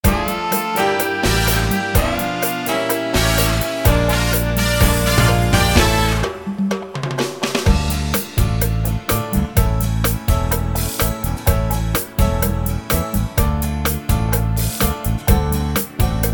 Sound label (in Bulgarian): TANCAT CHA-CHA, SINGBACK; BULGARIAN